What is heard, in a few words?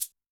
Shake; Yamaha DTXpress-II; Perc